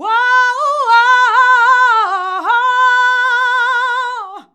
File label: "UAUAUUUH", FEMALES; Best Service; Hyper Dance